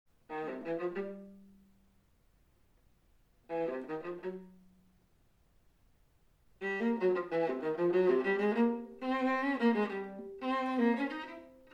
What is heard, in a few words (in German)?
Move On fuer Viola